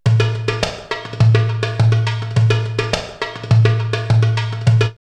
Darbouka 104, drumloops World Ethnic; X-Static Goldmine

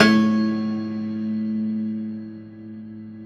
Rubbery Piano, prepared piano; Total Piano

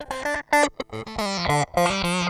SQUEEZER, Funk Guitar; 90 s Sample CDs; Zero G

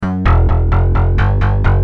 Bass, Industrial

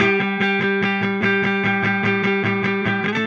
Silos Clean Strum Guitar, Indie Rock

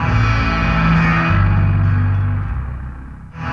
Fat Loop, Electric Guitar; Sonic Foundry (Sony Creative Software) - Friendly Fire

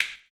CLP, CLAP; ILIO - Ethno Techno

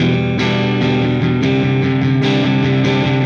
Silos Dirty Strum Guitar, Indie Rock; Sonic Foundry (Sony Creative Software); Indie Rock Anthems; Session 11 - Silos

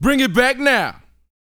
VOX F, Vocal Planet R&B & Dance HIP-HOP; ILIO

